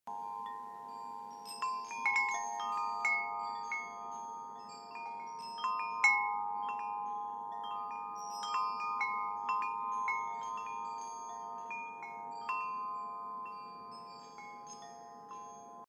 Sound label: Echo Double Chime